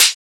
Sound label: Closed Hat; FLPRO